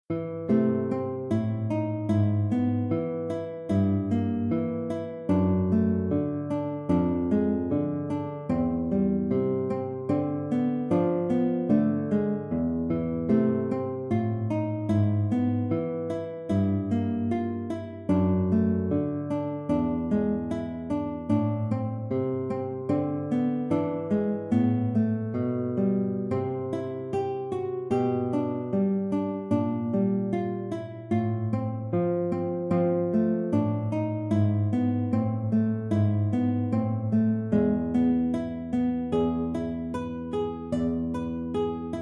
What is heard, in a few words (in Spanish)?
guitarra